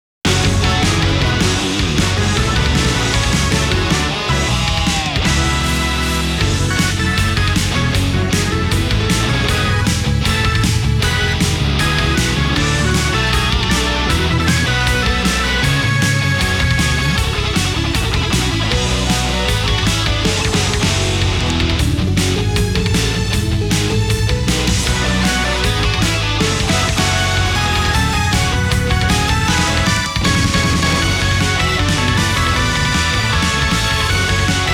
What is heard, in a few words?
Guitar remix; Kamihime Project; 通常戦闘 2